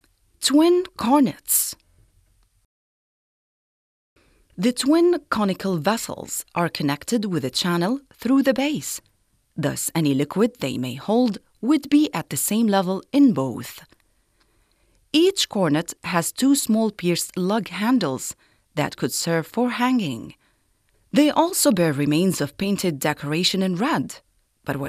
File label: TWIN CORNETS